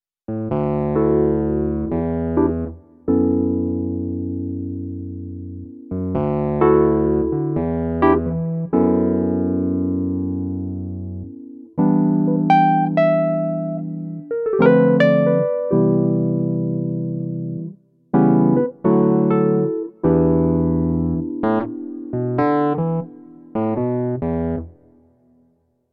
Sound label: Nord Piano 3 Wurlitzer